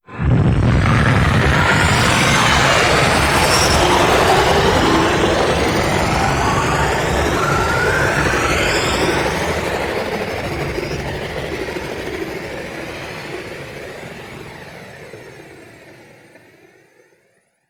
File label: Blue Sky Liset Relay Undocking, vehicles; Warframe; Liset Flight